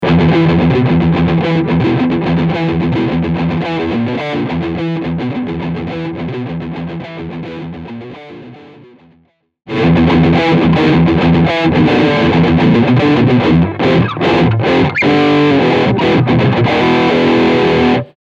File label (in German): Riffsound Steg PU